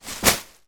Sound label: foley bin liner stuffed with clothes drop; zapsplat